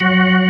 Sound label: full fast, ToneWheel Organ; E-MU Formula 4000 Series Vol. 3; Analog Odyssey